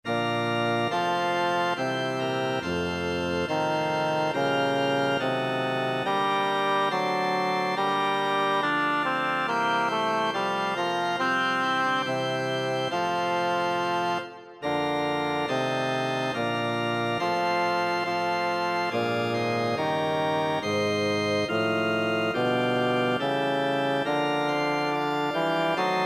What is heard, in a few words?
choral bass; bach johann sebastian; kantate bwv 048 ich elender mensch